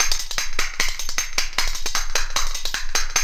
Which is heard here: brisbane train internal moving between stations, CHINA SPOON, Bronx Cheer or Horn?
CHINA SPOON